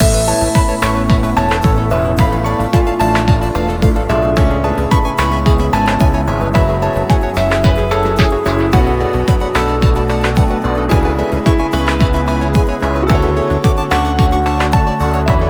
Water Strings; BGM ver.; Kuro no Utahime

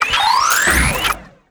solve

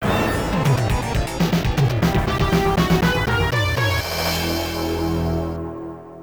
Snuff Out (Game Over); World Heroes 2 Jet; Neo Geo

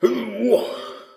windup; Dullahand windup old; Fight Knight

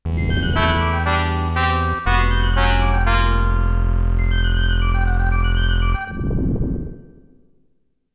Unification Fanfare; Koei Original BGM Collection

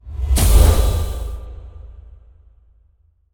shield activate

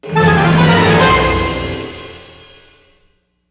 Merge String; Tornado Outbreak

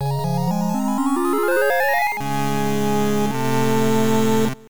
Stage Start

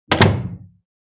Door close; Devour 2021 gamerip pc macos

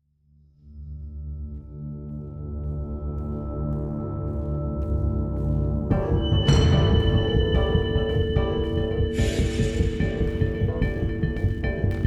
Action Loop

